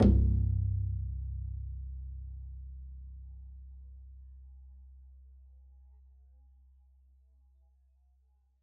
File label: pizz c1, double bass